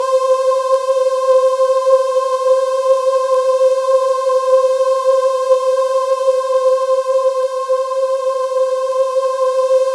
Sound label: C5, Synth Leads